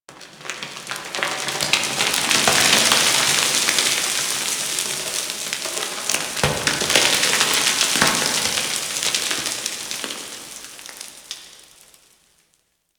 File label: Cave Rock Slide